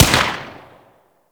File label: Bladed Pistol Shot; Warframe; Weapons; Tenno